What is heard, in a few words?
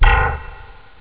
slap; jazz jackrabbit 2; M8